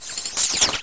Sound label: Attack; Alquamos